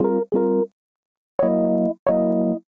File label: Dirty Back Bb min 86bpm; MIDI Clips; Accompaniment; Ableton; Chop and Swing